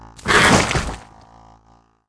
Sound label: scarab green death, monster